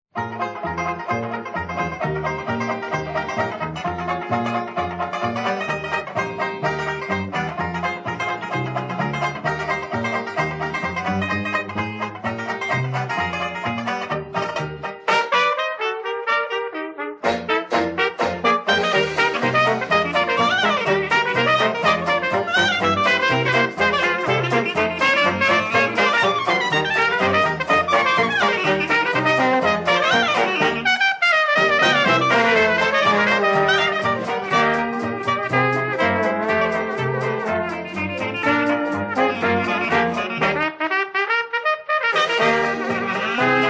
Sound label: Stomp